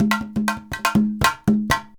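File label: emelabo grooves; Best Service; World Colours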